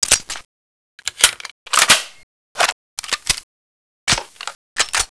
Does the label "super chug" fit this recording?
No